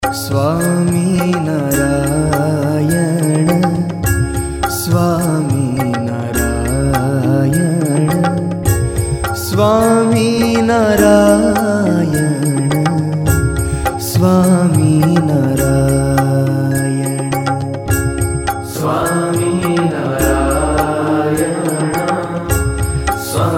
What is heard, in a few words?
peaceful dhun ringtone; Swaminarayan